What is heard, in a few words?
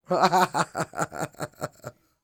LAUGH; Spectrasonics Vocal Planet; Jazz FX